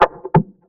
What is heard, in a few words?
Granulized Percussion Hit